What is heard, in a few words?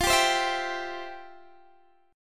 F Major 7th Suspended 2nd F, Guitar DADGAD